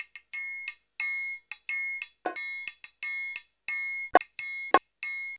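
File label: TRIAN, triangle, Modern Percussion Loops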